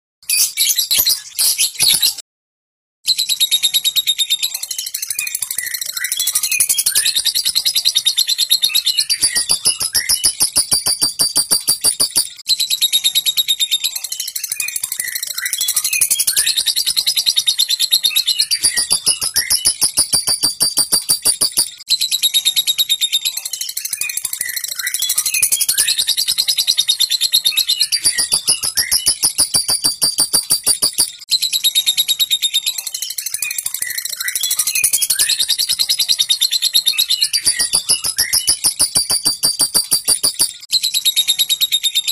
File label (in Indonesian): Suara Burung Lovebird Ngekek Panjang